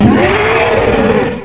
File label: ROAR; The Ultimate Guide to Mortal Kombat